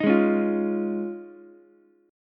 D sharp 7th Suspended 2nd Suspended 4th, Ukulele; D#7sus2sus4 strummed; JGuitar

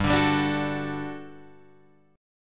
G Major 7th Suspended 2nd G down Guitar Drop D

